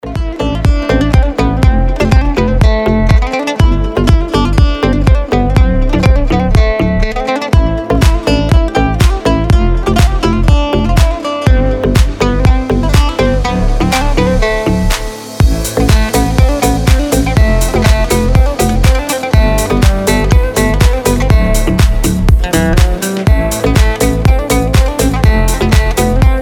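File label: ringtone eastern delight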